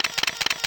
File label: Multi Shutter Sound; LG GS290 Cookie Fresh; Camera